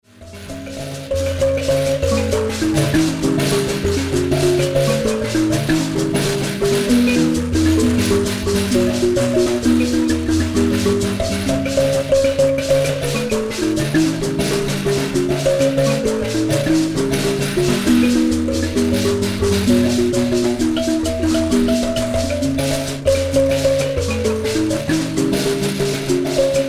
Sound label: mbira